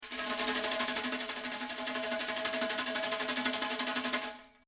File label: Neumann KM83 Tambourin 160cm front